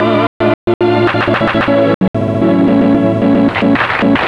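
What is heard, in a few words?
rhythm loops, 112 bpm; Rarefaction - Poke in The Ear With A Sharp Stick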